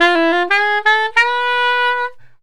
SAX

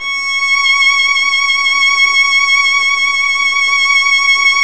STR VL C#7, STRINGS; Roland - LA Composer vol. 1

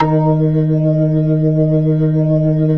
B-3 D#4, Jazz; Keyboards of The 60's and 70's

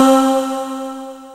Q C-SY WAV M

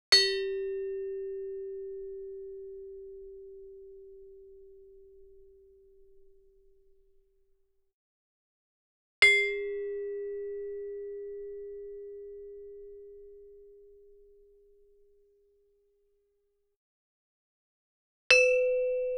Gamelan; Propeller Island - Complete Gamelan

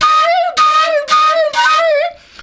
FLU + VOC, FLUTE + VOCAL; Best Service; World Colours

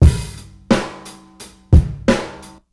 beat 88bpm, Hip-Hop Street Beats; Sonic Foundry; Hip-Hop Street Beats Construction Kit; Street Beats by Poogie Bell

